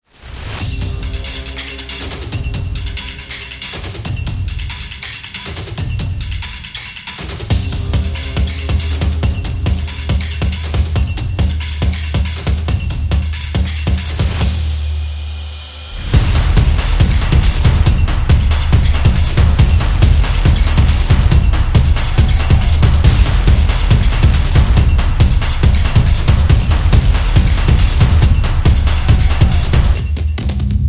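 Soccer Football, Street percussion background music built for sport, motion, and dance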